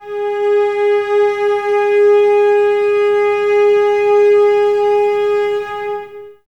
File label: Cellos G#4, String Ensembles, No Vib; Miroslav Vitous